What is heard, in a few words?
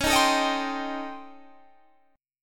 A 7th Sharp 9th Flat 5th